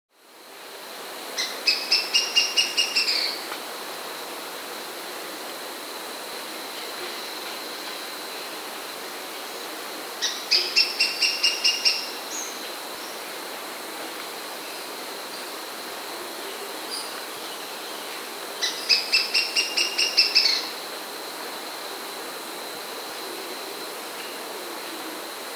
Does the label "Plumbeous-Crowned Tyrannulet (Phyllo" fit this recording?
Yes